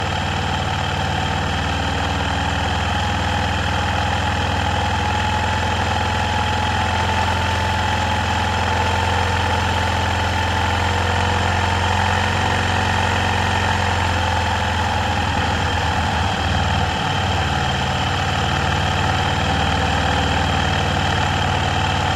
ATV Mono Medium Steady Rpm Loop, ATV ARCTIC CAT 650 H1; DavidDumais